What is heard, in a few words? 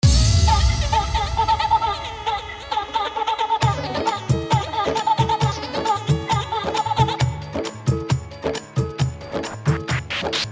Holi Full Hard Dholki Bass Mix